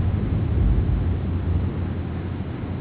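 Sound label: wind loop; quake2; mnhcoop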